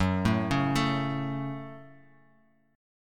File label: F sharp Minor F sharp, Chord; arpeggiated; JGuitar